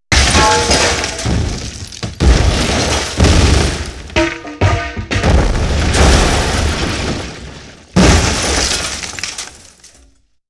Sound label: Explosion